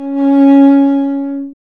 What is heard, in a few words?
STR, Cb Bowed, Cb1 mf vb; String Master Series